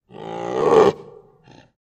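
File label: Tiger High Growl Young; big cats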